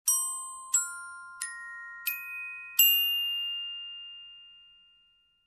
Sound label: Slow Glockenspiel melody apreggio